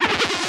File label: interface error, error tones; Multiple interface error tones at various pitches. Error, Interface Pitch, Tone Web Efx, Error